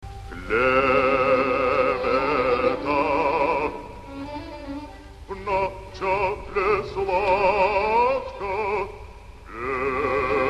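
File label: ukrainian bass; basilio; ukrainin bass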